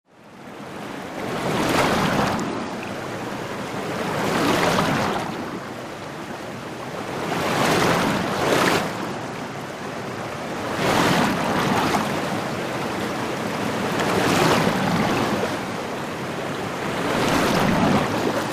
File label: Panned Waterfalls Simulating Rapids, With Water Detail Close Up